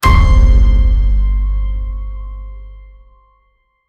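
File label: cinematic trailer piano hit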